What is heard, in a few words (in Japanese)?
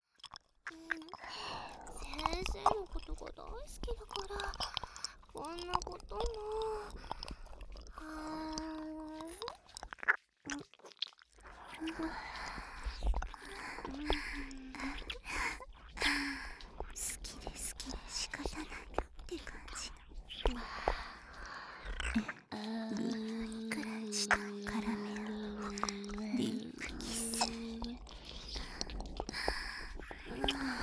両耳舐め＆ディープキス; JK3人のハーレム; 手コキ♪